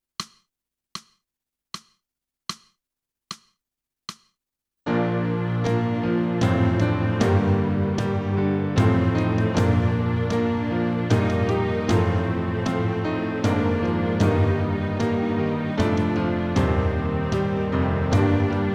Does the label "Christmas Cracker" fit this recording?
No